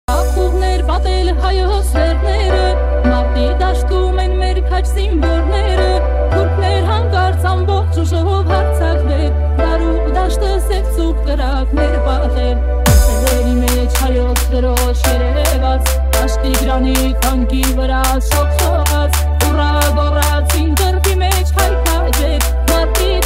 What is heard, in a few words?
beats remix